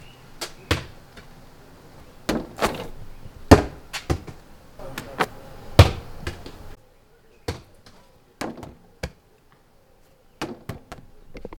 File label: Basketball Through Hoop